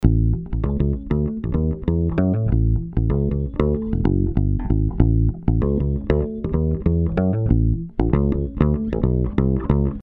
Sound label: bass